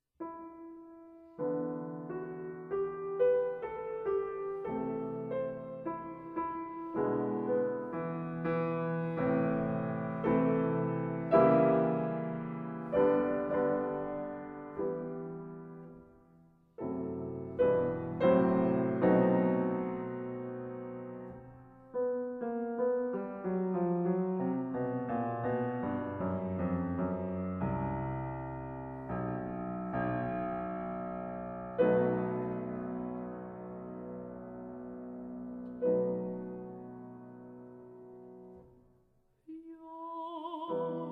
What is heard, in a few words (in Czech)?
romansy; cajkov